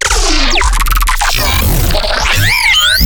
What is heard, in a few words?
glitch stab; Virtual Riot; Glitch Stabs